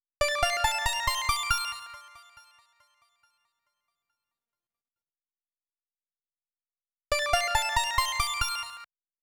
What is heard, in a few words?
139 BPM G Min Pluck; Melody; Cymatics; 2022 Melody USB Expansion; Hemp